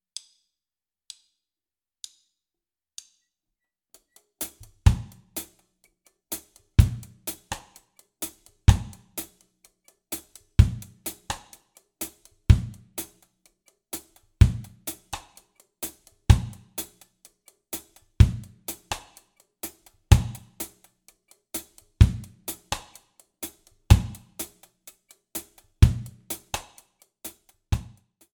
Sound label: reggae one drop feel